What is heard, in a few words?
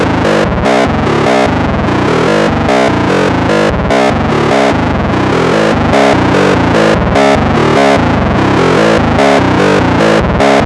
ARP 2600, SEQ; TECHNO-BOX